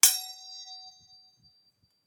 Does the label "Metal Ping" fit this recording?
Yes